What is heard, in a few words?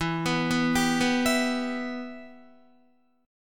E Minor E, Guitar, 4F,4A,5C,5G,5C,6E, Em chord; Em arpeggiated; 11,14,11,12,11,12; JGuitar